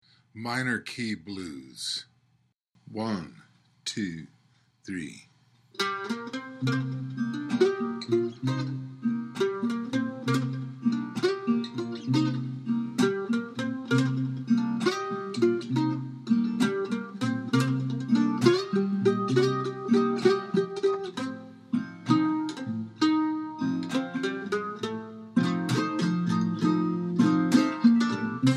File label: Minor Key Blues